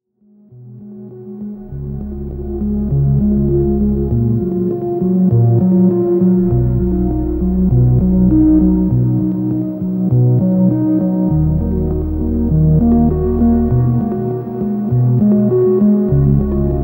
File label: slow grooves